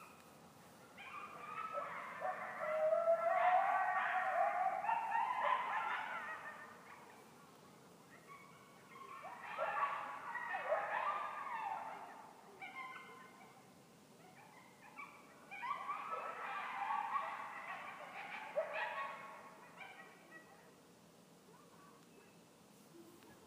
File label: Coyotes; Wagoners West; RV